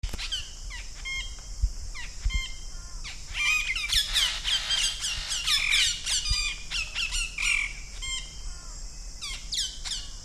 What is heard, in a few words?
red cheeked parrot